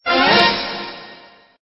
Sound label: Stinger; STINGERS